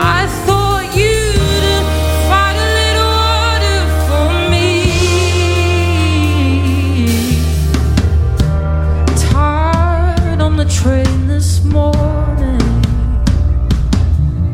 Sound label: drums sample